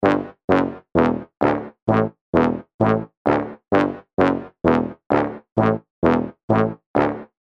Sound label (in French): tuba